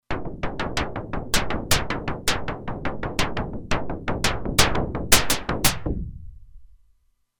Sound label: Noise Percs, Roland Alpha Juno-2; Factory 2